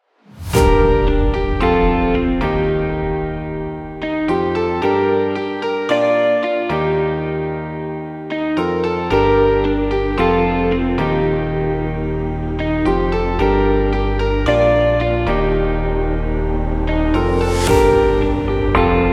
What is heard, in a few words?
Instrumental Mix